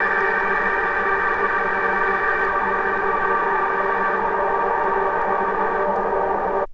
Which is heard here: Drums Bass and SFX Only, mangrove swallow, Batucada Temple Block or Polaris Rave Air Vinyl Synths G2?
Polaris Rave Air Vinyl Synths G2